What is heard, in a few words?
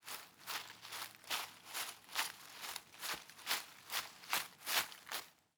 Footsteps Leaves; Haunted Bloodlines; SFX Producer